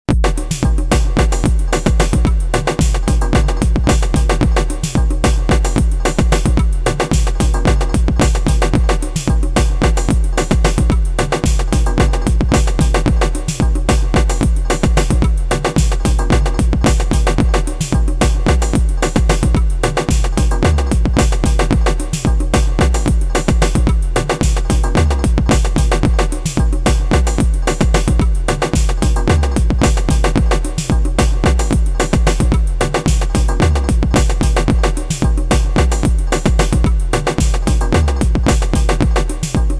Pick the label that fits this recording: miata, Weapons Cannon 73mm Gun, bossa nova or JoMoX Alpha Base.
JoMoX Alpha Base